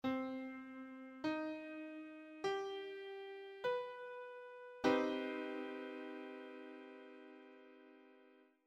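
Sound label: Cm Maj7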